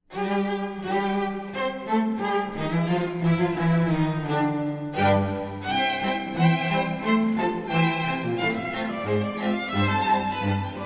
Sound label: Country Club Rag